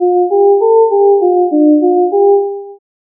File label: clean slowed reverb